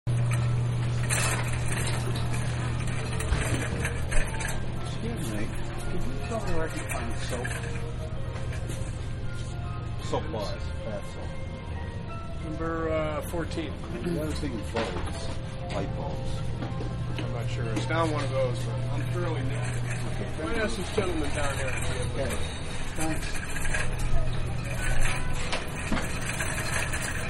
Supermarket Big Cart Perspective, Supermarket Big-Cart Perspective Ambience; Stores and Malls; Manhattan Production Music